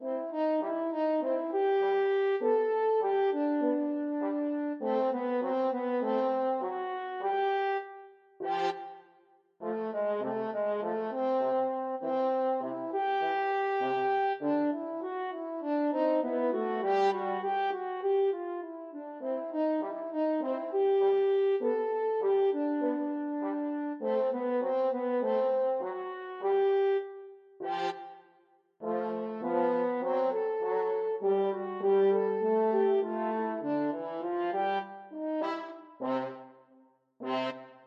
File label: french horn duet; 24th st rag